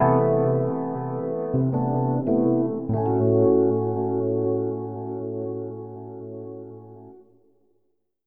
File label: Unfinished Puzzle, Plays when the puzzle is not completed before 1:00:00.; Club Nintendo Picross